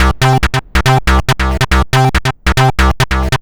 Trance Bassloop 140bpm, Bass Arps

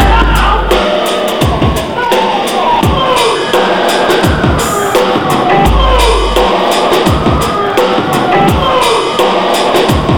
85 bpm, Breaks; POTBreaks

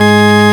VOX, VOX ORGANS; Greytsounds VOL-1

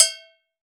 Agogo Bell H; Roland BK-5; Percussion

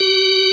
B3 G#3, organ; VOCAL ORGAN; ORGAN #4

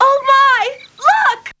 VOX; Zero G; Man Machine

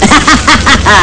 hahaha; Amiga Soundtracker Sample Packs

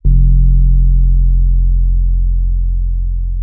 Rhodes MK1 F1; Xsample VOL-1

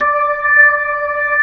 B-3, Jazz

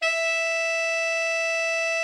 TENOR, TENOR SAX; Best Service; Brass Super Section

